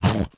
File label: goblin toward; Crypt of the NecroDancer